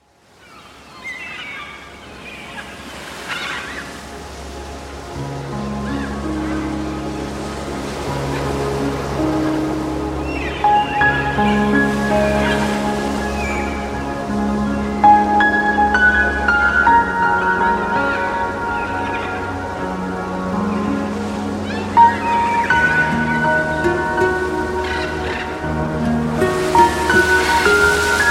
oppo alarm ringtone

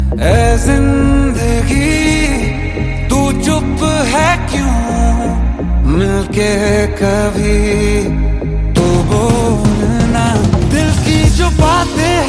hindi ringtone, bollywood ringtones

